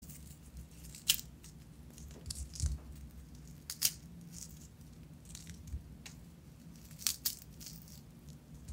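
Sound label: waffle sounds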